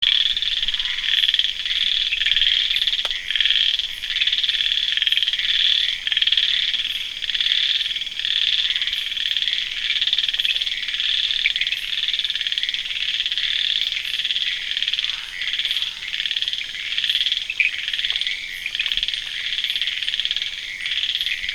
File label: Microhyla heymonsi